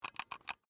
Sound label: Mouse Click Twice, Technology, Mouse, Click, Twice, Wireless, Laptop, Mouse; Computer